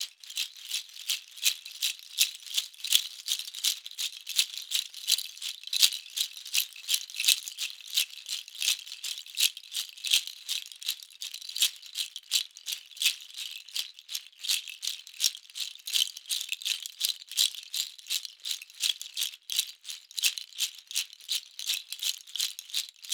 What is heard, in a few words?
Deertoe shakers; Crow Hop (Weemmarategwa)